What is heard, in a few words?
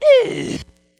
"huh", voice